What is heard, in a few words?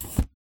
gear, combine soldier; npc